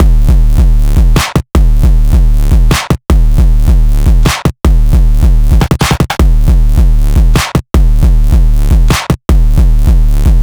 break fuzzy Club; M8; percussion and foley

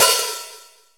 DRM, YAMAHA R5+RX; Best Service ProSamples vol.55 - Retro Sampler; AKAI

